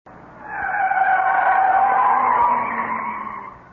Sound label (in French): auto frein